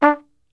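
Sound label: D2, flugelhorn, brass; Best Service; Brass Super Section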